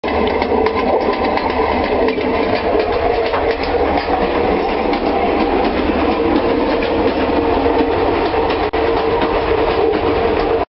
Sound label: thai train loo